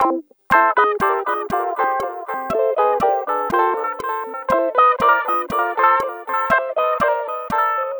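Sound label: Guitars 120 C; Zero-G Kocktail Kollection; Adrennalinn 2fers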